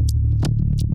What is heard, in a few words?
weird bass